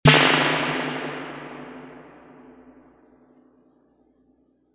reverb tank 3 spring long drum machine snare

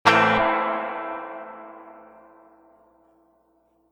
reverb tank 3 spring medium quick strum

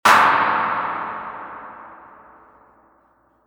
reverb tank 3 spring long drum machine clap

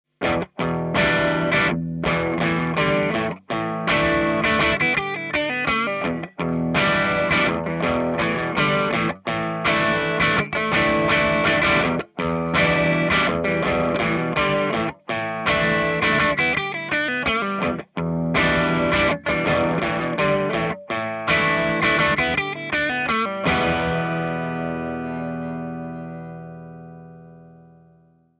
greenback crunch

